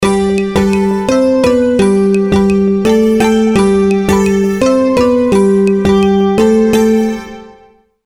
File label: Layered; Alcatel 5049W T-Mobile REVVL; Vaca FX4